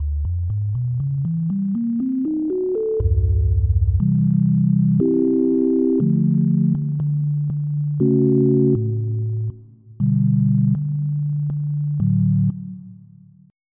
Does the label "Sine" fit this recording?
Yes